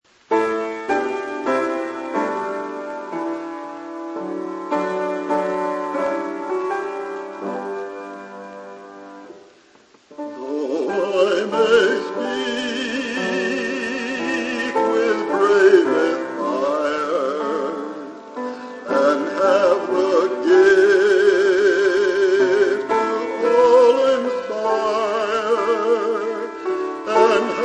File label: solo, choir anthems